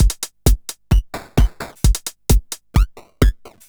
Crushed Beat 130BPM; bitcrushed; musicradar

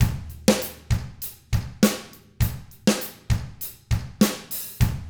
ROCK, Drums; ILIO; Double Platinum Drums 1

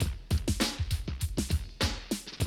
drum loop, 100 BPM

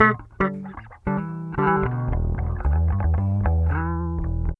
BASS, Funky Ass Loops; East West

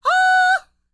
Vocal Planet World Voices, NOMADIC

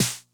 Snare Accent Low Tuning High Tone Max Snap, 909; classic machines

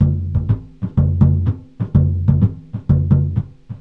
SURDO LP; E-MU Producer Series Vol. 5 – 3-D Audio Collection; 3D Percussives; 3D Ethnics

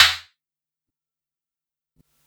Quasimidi 309, Drums

